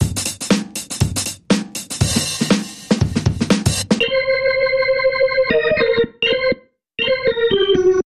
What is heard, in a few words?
Blue Knot Funk Bumper Reversed